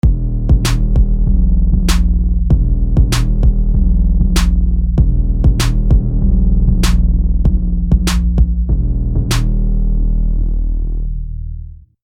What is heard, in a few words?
thumpty bumpty